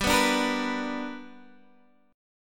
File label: B Minor Major 7th Sharp 5th